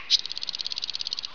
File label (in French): service